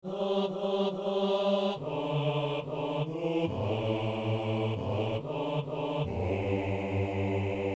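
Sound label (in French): notre père et notre dieu, hommes; Saint-Denis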